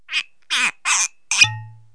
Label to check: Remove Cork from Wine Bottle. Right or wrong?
Right